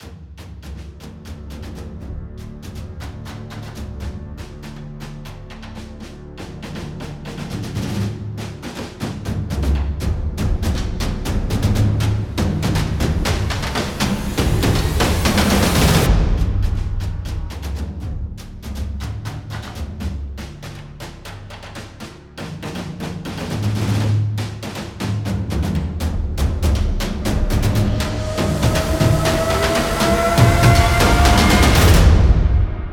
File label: Cinematic Epic Percussion Trailer